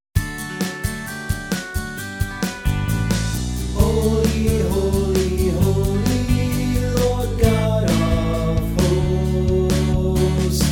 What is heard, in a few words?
Holy Holy vocal